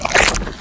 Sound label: bullsquid chew; hl2